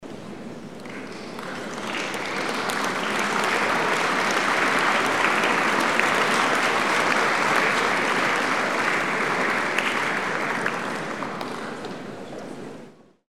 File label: applause concert hall